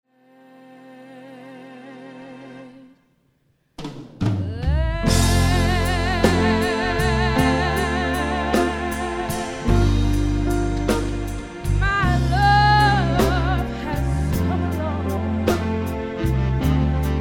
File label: live mix